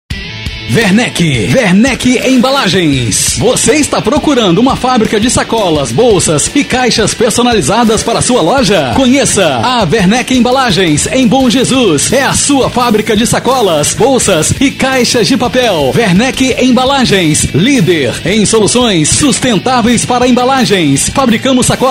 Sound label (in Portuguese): vinheta